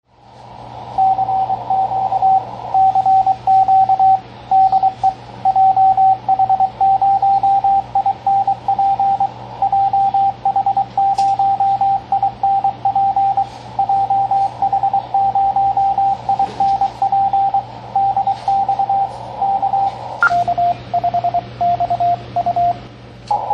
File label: Snags on 10 Meters, cw; CW Learning Programs; sounds and personalities of cw and transmitters